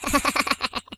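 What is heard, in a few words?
Laugh, British; hedgewars; Default uk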